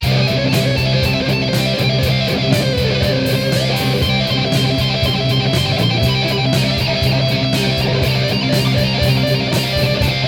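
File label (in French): Colère, Les émotions; Colère 2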